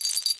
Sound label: Jester bell